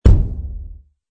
Kick Acoustic Kick

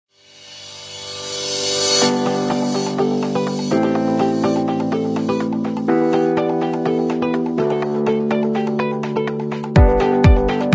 Corporate Upbeat, 混合使用钢琴，合成器，电吉他和鼓; 音乐素材 企业乐观

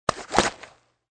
Gun Foley Pistol

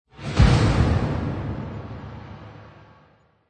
Epic Movie Trailer Slam

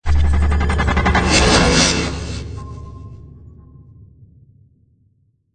Apocalyptic Transition Stutter Metal Grains

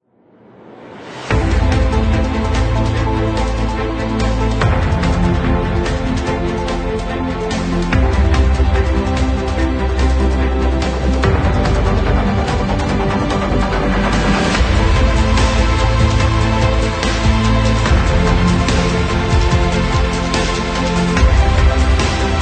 Epic Technology

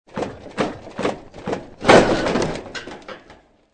Footsteps Metal

